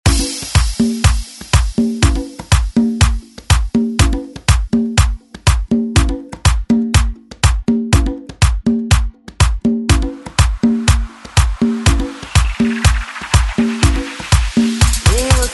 Latin Mambo; DJ Mhark; ReDrum

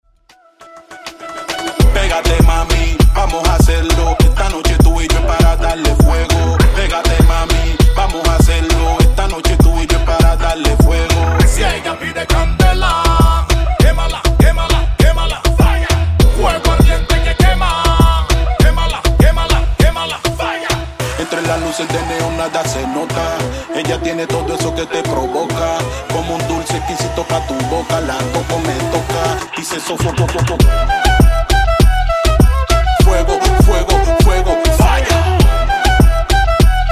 Dj Intro Outro 100 Bpm

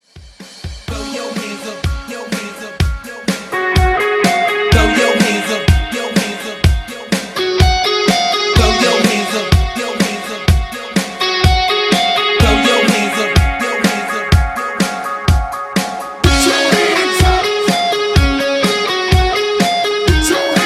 Acap Out Epic Mixshow Party 125 BPM

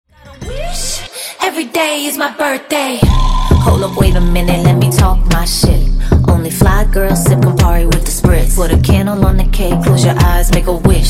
Funk Top 40 92 bpm

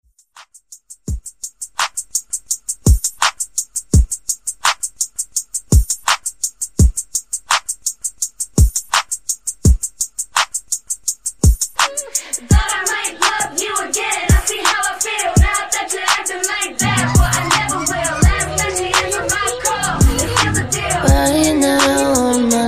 84 BPM

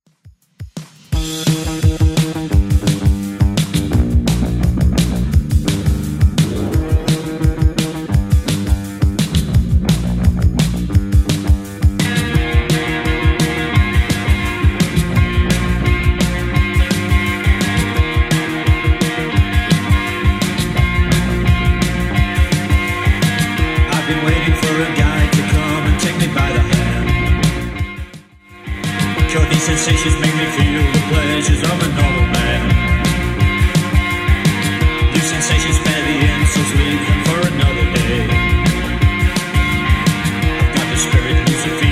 70s Redrum 171bpm

